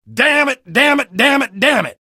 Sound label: negative vocalization; engineer